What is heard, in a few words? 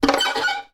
Violin Screech Noise Short; soundscrate